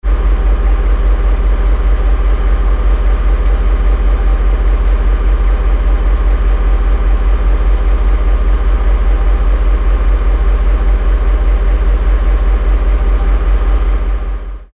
diesel locomotive station

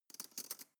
cutlery teaspoon drawer place soft

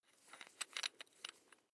electric drill bit unfasten wrench turn

